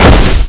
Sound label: jump start